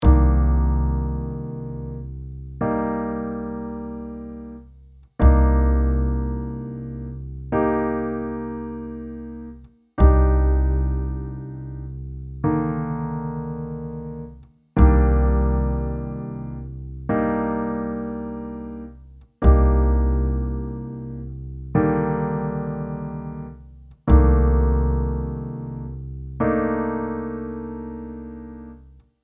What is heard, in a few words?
LH rootless minor bass